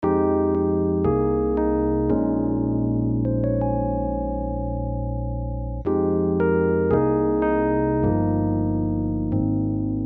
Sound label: rhodes dry